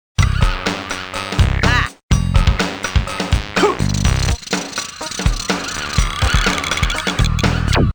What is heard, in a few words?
halloween sound